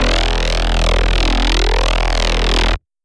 Reese one shot E 175

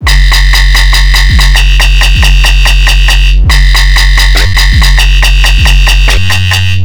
Bass Loop F 140BPM

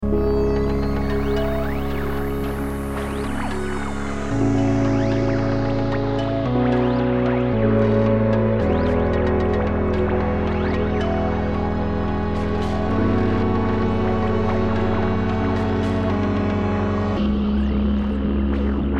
Halfbeat; Blocks

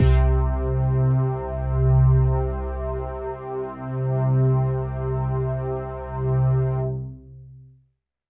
Bass; Safe

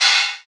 Cymbal

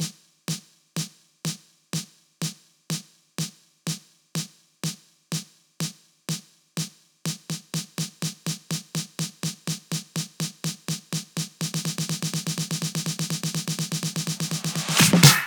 Long Fill 124

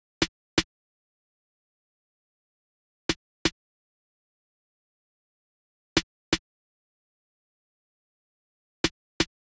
Perc

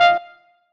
Fm, Synth Stab

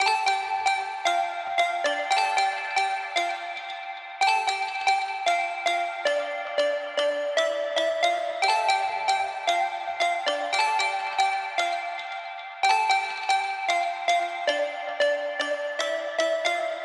Synth Pluck D

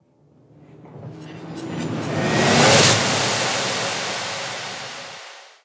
Fx Riser, 170

